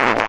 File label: fart short two bursts